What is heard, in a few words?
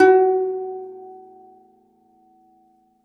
DOMRA, Plucked Strings; E-MU Producer Series Vol. 7 – Old World Instruments